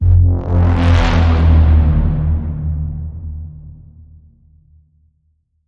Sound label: Bend, F